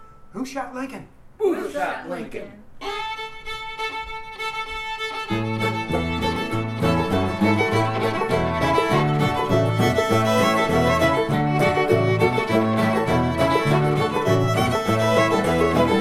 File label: Booth Shot Lincoln, Stringband